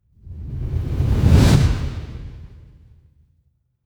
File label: fire electric whoosh flame